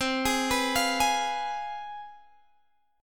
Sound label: C Major 7th Suspended 4th Sharp 5th